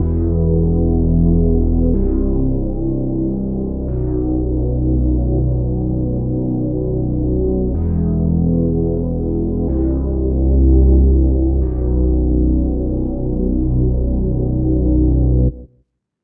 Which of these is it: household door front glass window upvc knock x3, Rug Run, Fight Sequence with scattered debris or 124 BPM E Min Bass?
124 BPM E Min Bass